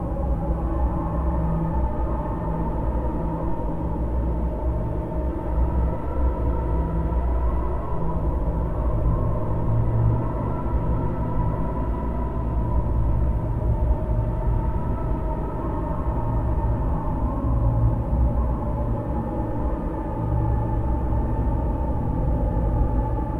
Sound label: storm wind; WFRP Night of Blood 4e FVTT